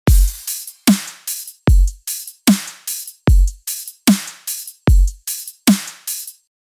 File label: Tech House Loop